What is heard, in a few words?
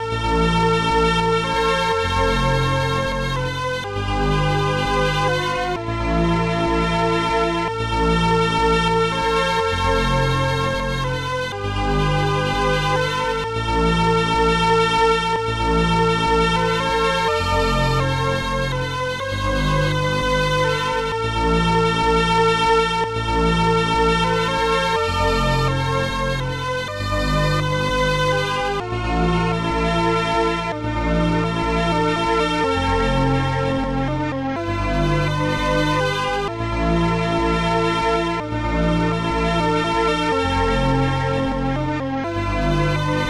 MELODRAM